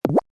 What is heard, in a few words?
heart pickup